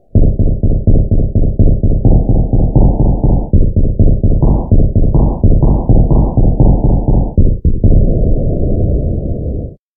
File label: gun shot bass